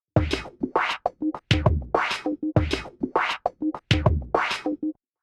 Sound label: drum off